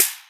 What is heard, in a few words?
perfume spray